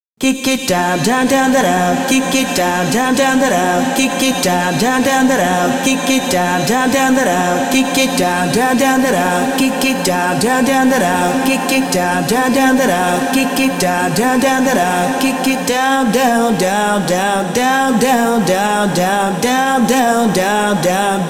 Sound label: Acapella Vocal